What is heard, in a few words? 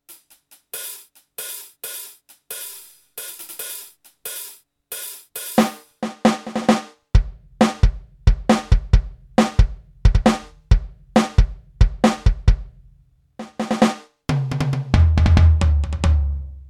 Millenium NonaPad Modern Pop Kit